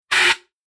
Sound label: Emergency Fire Brigade Fire Extinguisher CO2 Gas Single Blast Squirt Flame Burn